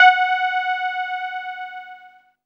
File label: SIEL, String Machines